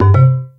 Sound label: alert; frappe